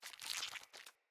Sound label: Foley Sniffle; Friendly; Avakot